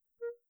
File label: staccato barrel, clarinet articulation